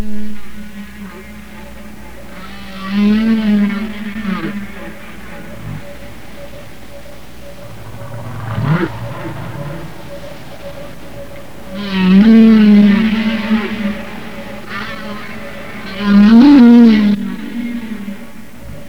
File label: humpback whale